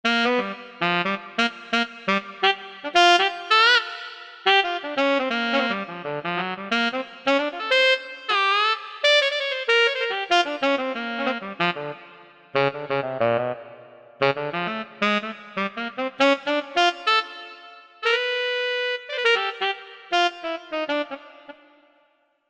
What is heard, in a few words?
Tenor Sax